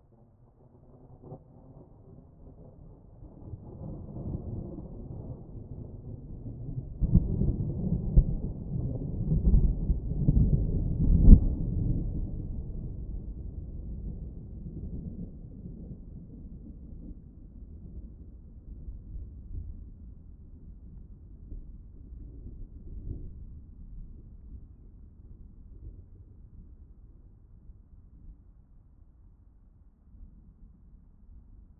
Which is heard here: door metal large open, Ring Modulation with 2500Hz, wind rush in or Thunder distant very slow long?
Thunder distant very slow long